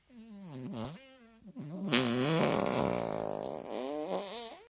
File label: fart medium; Farts